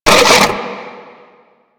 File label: flying robot engine start; Warframe; Veilbreaker; Quests; Ambience; Kahl